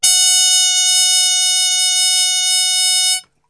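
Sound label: F#2, Brass, trumpet harmon mute; Best Service; Brass Super Section; TRP HARMUTE; 90 s Sample CDs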